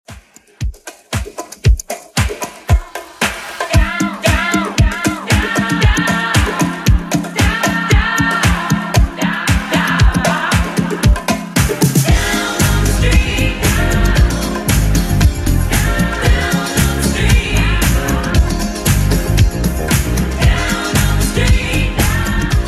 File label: 80s Redrum 115bpm